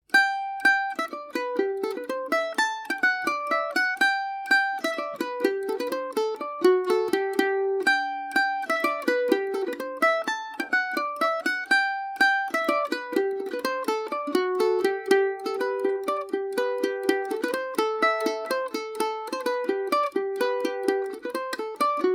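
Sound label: captain byng g major, mandolin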